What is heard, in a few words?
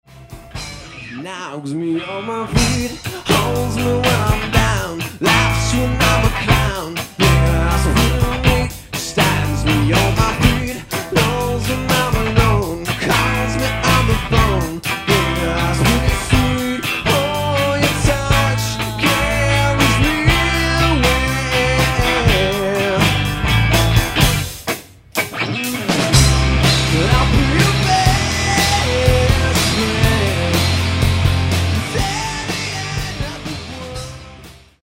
Pop Song